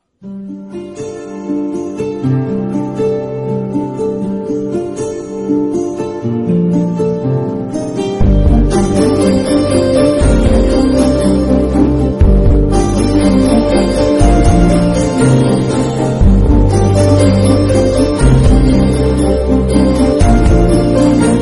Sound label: Love BGM Telugu Ringtone